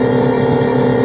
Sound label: hoverbike engine; There; ThereClient